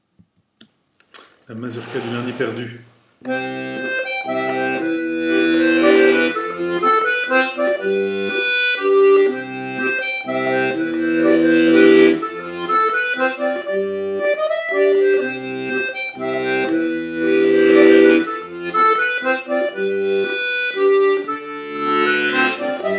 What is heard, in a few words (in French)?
Mazurka, accordeon; Mazurka du lundi perdu